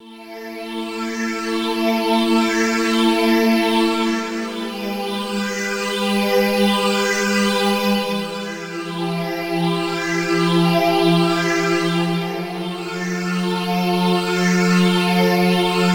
Ethereal Melody in Am; Equinox; equinoxsounds

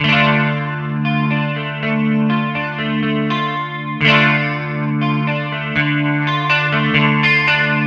Slap guitar D minor; SLAPJOHNSON

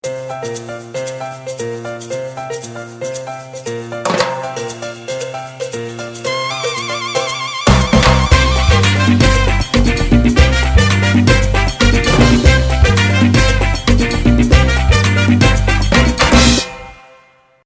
latin groove

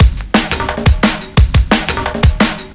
DnB Loop

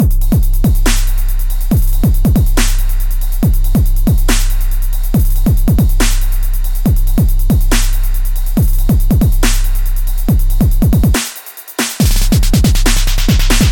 Crunk-ish Dub; TrystanSeven

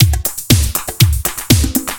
Loop

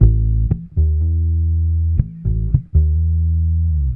Cool Bass Loop; Serial Chiller

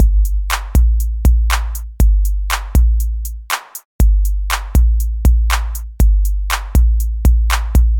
booty drumz; DUCE132

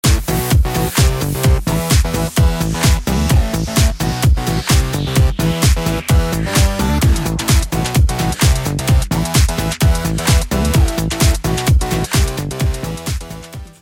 new song

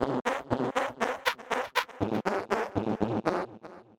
Epic Farts 120

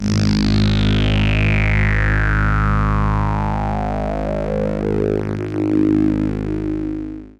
Acid Phazz FX; Serial Chiller

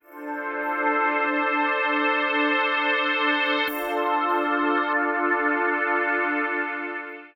Chilla Dream Pad; Serial Chiller

